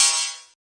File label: crunchy kit crash; STYLE KITS (Hip Hop, Etc)